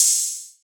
open hihat

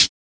Closed Hats

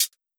Hi-Hat

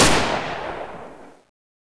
Fx

